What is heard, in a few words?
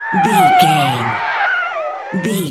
tyre skid